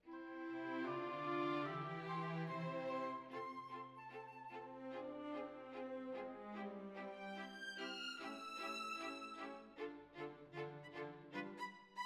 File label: Violins Over